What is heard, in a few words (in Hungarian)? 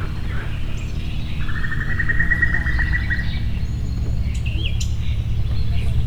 kakukktojó; Tiszababolna